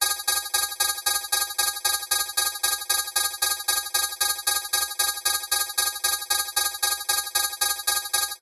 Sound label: science fiction alarm fast warning tone emergency